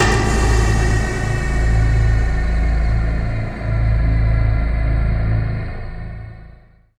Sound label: atmo; Techno Trance Essentials; FX & ATMO